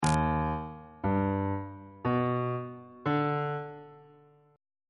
e flat augmented major 7th chord intervals on bass clef